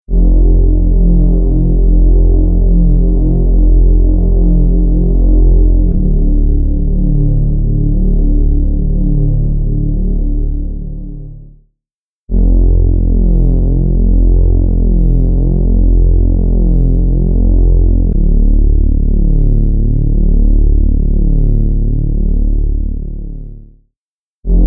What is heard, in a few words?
Bass Empty Mall; Tverb; Preset: Empty Mall